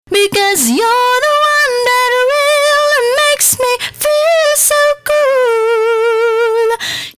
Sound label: rock vocal distortion